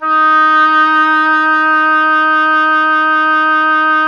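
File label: WIND OBOE; Miroslav Vitous; Solo Instruments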